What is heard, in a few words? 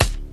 Mekanik Bd, Kick Drums; Zero-G; Soundclash